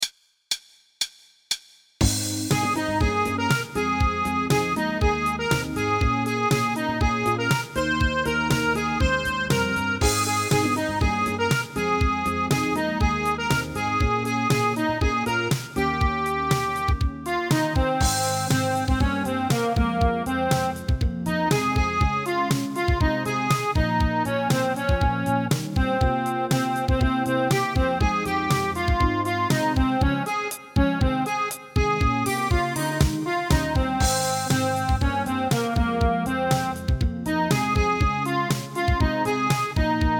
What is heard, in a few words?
goodbye train Lead